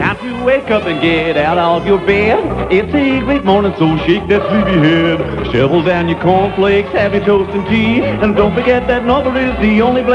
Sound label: breakfast jingle; nova 738am; tony allan